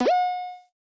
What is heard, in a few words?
Perc